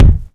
smooth kick sound c sharp key; Channels Mono

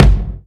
rich mids kickdrum sample f sharp key

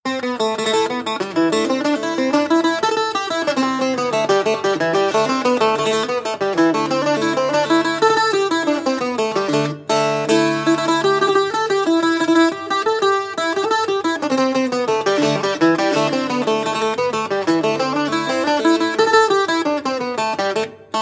irish bouzouki folk melody; loops

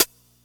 soft sounding hi hat g key

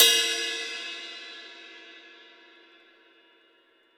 ride f key

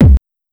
rich lows steel kick drum sound c key

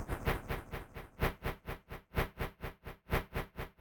rave sequence C 126; synth loops

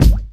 00s loud steel kick drum g key; kick drum samples

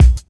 fat kick drum b key

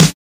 2000s subtle reverb snare drum d sharp key

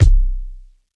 phat steel kick drum C key

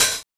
dry high hat sample g key; tuned drums

